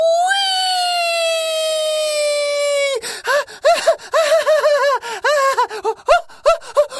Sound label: weeeeeeeeeeeeeeeeeee